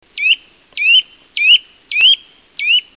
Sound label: Sitta europaea